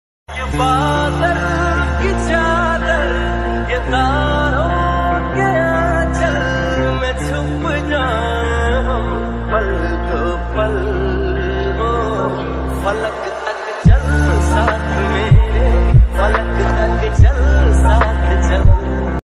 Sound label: ringtone lofi ringtone slowed reverb ringtone